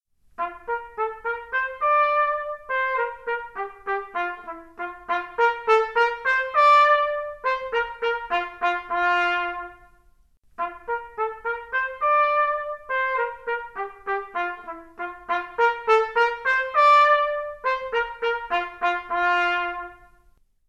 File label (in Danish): trompet